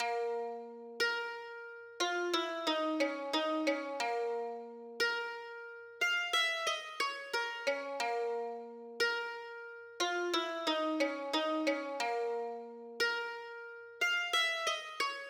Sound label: Tune Minor; Bb